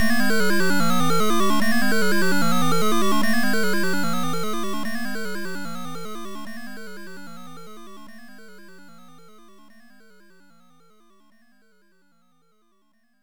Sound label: Player Miss; Jump Coaster